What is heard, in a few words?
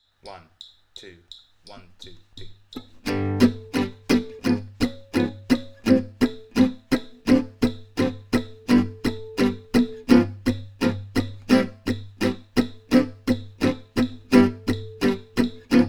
g major backing 170bpm